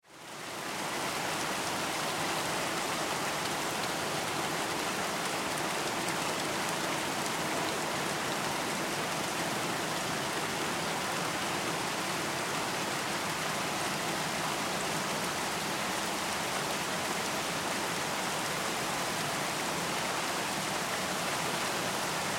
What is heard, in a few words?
Weather Rain Pour Ambience